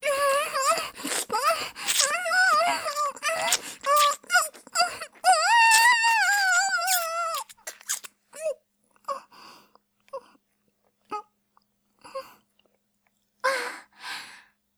ごっくん; いちゃらぶ

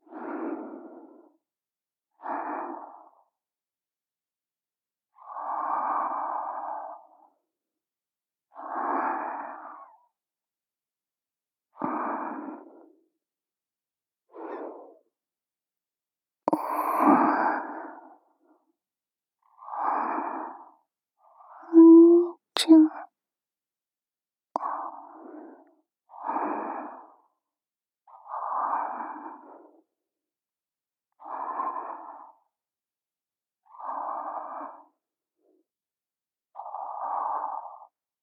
「妹の寝息(左)」(ループ), ASMR; SE有り

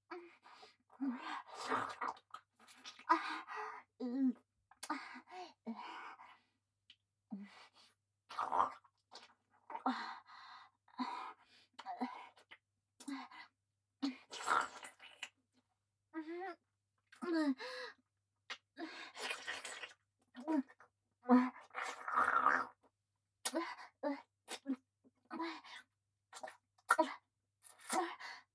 クンニ, Loop音声

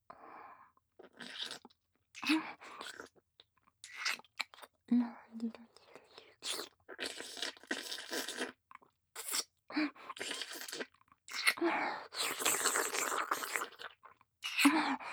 乳首舐め（フェラ）

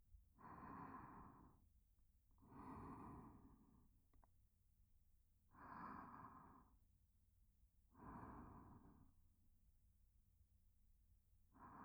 寝息; おまけループ音声

